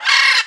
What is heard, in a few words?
Bird Cage Idle; angry birds rio